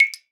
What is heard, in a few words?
Drips